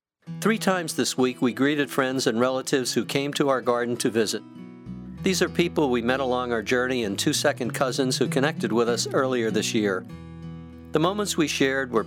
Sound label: laughter